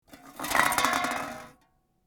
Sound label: stack Opening